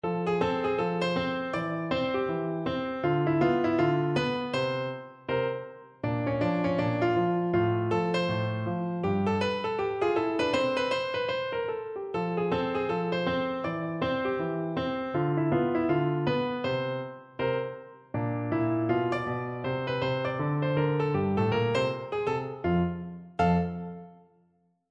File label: rag, piano